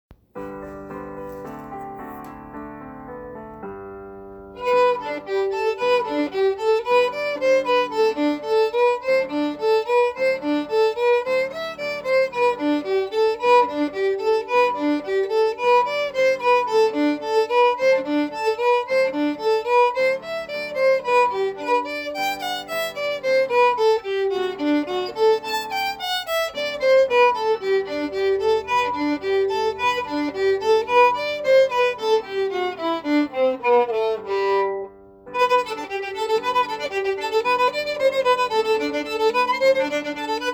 etude, fiddle

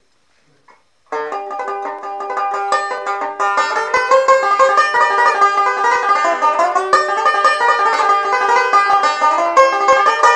bugle call

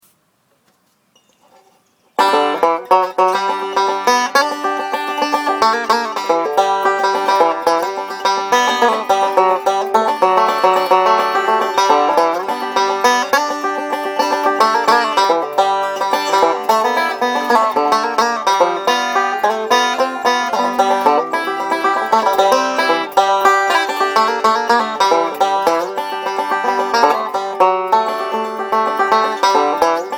banjo

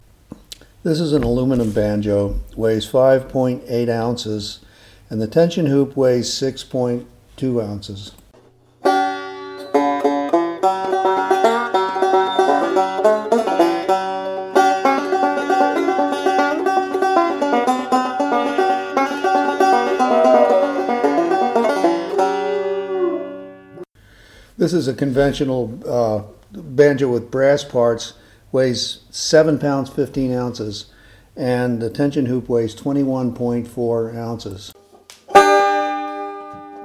banjo

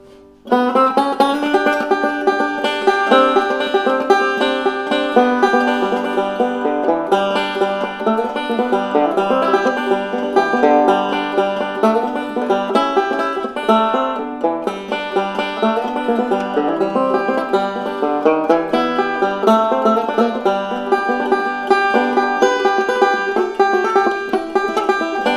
banjo

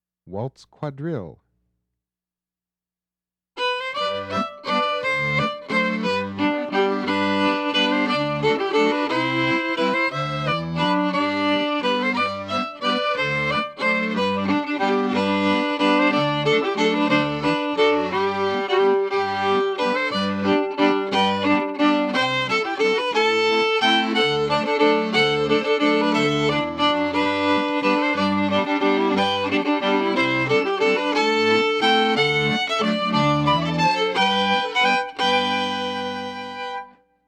waltz quad, fiddle

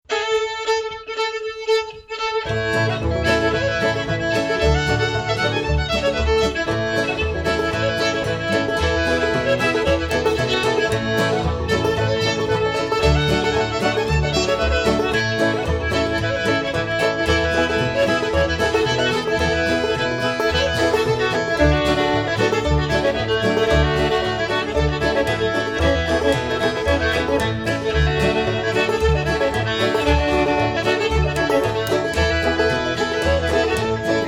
crows nest, fiddle

